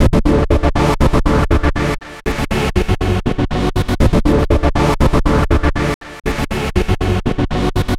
Trance Synth

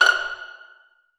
PIZZ C5 MF, Orchestra, STR PIZZ; Sound On Sound - Peter Siedleczek's Orchestra

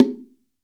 BONGO, STEREO BONGO

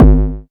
BD-Analog, Korg Radias

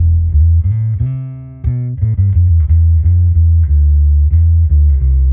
Bass Guit 90 E, Loops n Lines, 90bpm

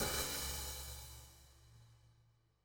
CYMBAL, ELECTRO KIT

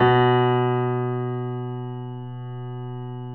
B 1, Keyboards Acoustic, GRANDP ST; USB Soundscan vol.17

